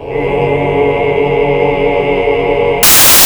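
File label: chord 2 2 R; Best Service Gigapack 1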